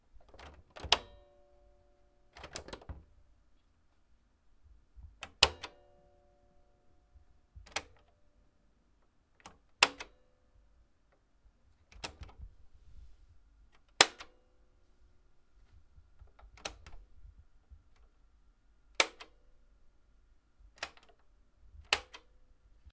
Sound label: closing an old metallic resonant locker